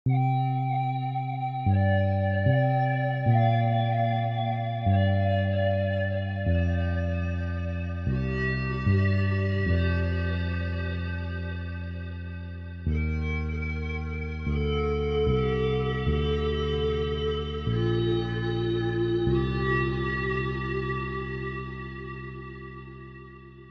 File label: game over